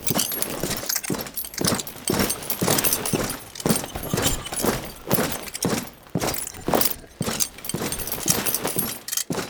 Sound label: Horse Steps Gallop Loop Armour Foley; Warframe; Duviri Horse; Vehicles